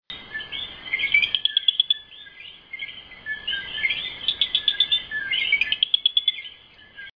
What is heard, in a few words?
eleutherodactylus antillensis carolina puerto rico; an aggressive warning to other males, "ko-KI-KI-KI-KI-KI..."; West Indian